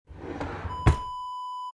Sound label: drawer kitchen close